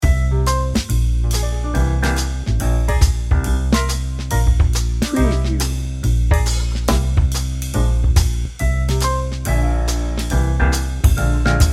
big band loop

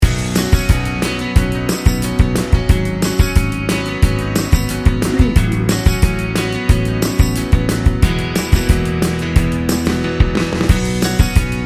americana

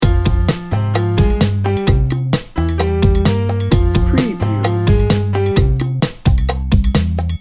sultry chica loop

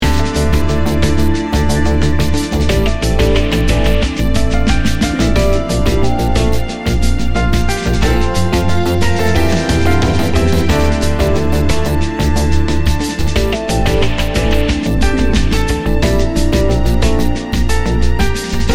somber reflections

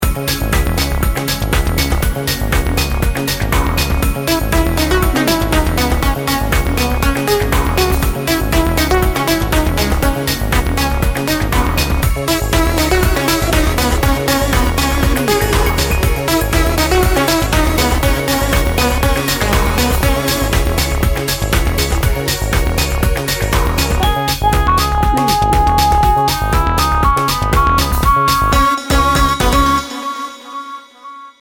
synthetic motion

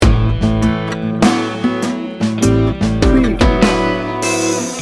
blue collar blues loop

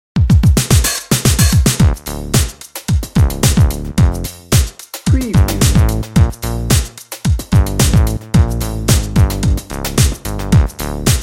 bouncy electro-pop dnb

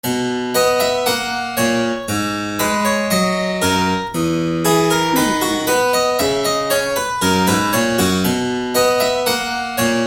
regal dance

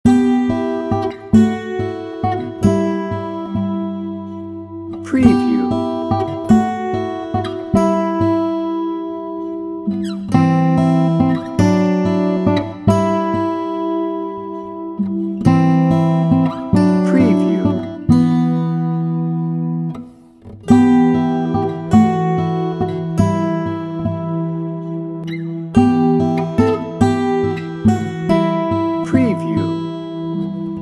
warm drama

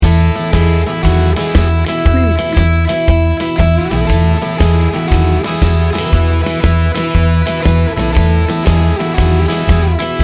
bouncy 15 second

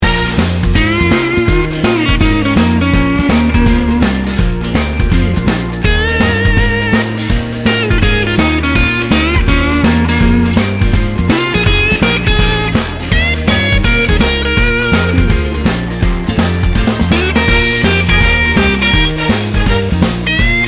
blues

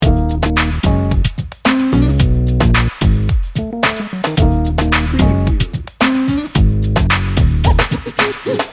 cool funk loop

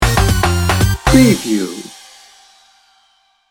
happy hip hop sting bumper

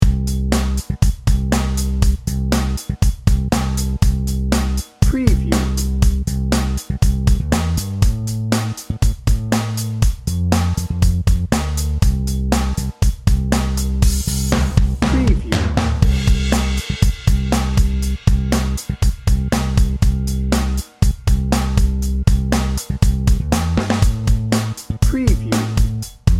party pop rock

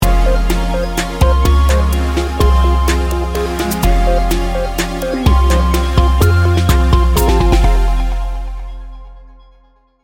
smooth chill sting bumper

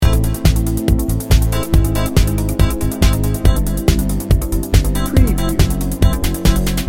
fashion pulse loop

